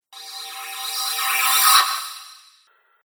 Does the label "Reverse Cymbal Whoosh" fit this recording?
Yes